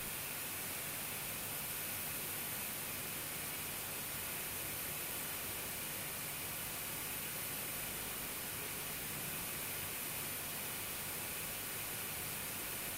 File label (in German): Tonabnehmer E Gitarre